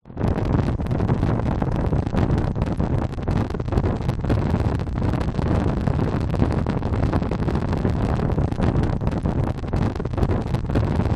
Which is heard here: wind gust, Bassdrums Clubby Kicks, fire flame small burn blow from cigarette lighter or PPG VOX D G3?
fire flame small burn blow from cigarette lighter